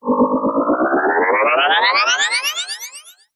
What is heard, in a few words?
cartoon grow